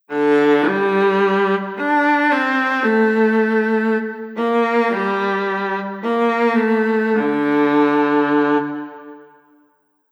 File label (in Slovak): viola